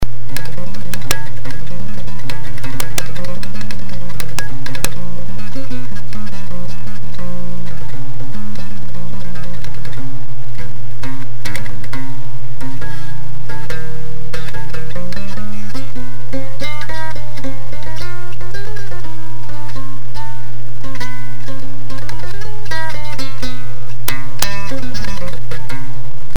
bass; Bass Solo; A cool bass solo i added

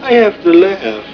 laugh; caddyshack

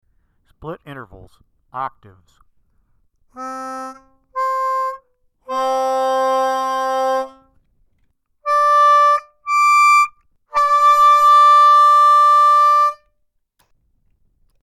Split Intervals

